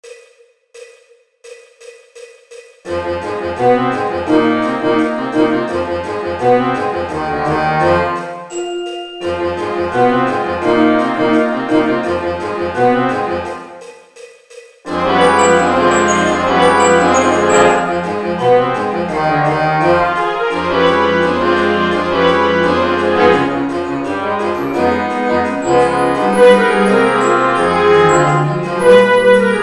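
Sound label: click with mallets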